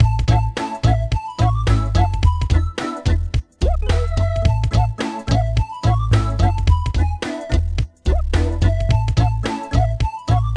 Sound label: Music; CyberMycha 01-2004 (Poland); Klik